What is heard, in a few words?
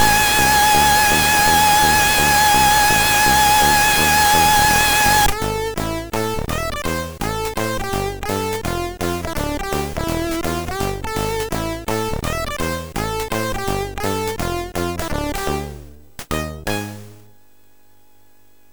Jingles, MOS6581R3; hvsc